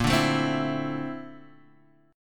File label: A sharp Major Sharp 11th, Guitar, Open G